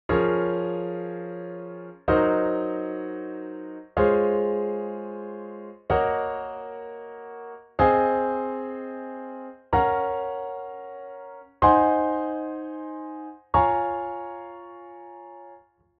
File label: Mechanical 7ths